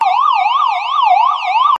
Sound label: POLICE Siren US; TRANSPORTATION